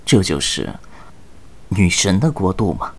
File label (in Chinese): 死亡3; 年轻的修士; ycrpg; BattleCry